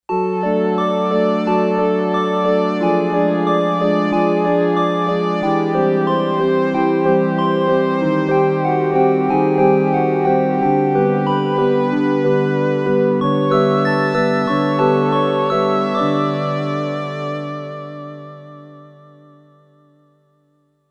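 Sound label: Synth Ensemble 2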